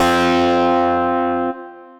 Tension Crunch, Synth Lead; Ableton; Factory Packs; Synth Essentials

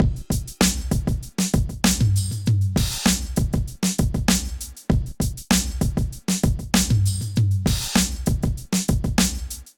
Kit groove 80bpm, Drums; MIDI Clips; Dobbs; Ableton; Factory Packs; Chop and Swing

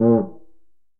Cuica Low; Latin Percussion; Drums; Drum Hits; Ableton; Factory Packs

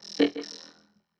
120 Neo-Wave Vocoder; Pop Rock

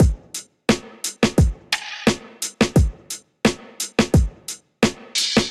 Slingshot Kit 87 bpm, Golden Era Hip-Hop Drums; MIDI Clips; Sound Oracle

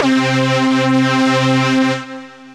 Wood Panel Lead, Synth Lead; Ableton; Chop and Swing

